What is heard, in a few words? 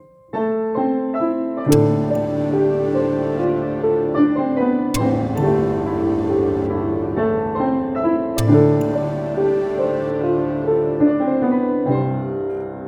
Piano tempo 70; anderegeluiden